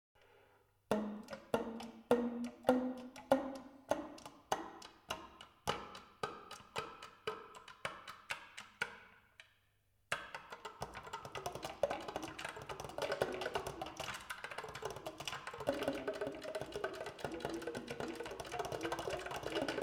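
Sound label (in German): sop, Klappenperkussion, Tiefster Ton b bis e1 (angeschlagen mit der F-Klappe); f1 bis h1 (angeschlagen mit der H-Klappe); freies Spiel mit Klappen (verschiedener Dichte). Sopran; saxophon; spieltechniken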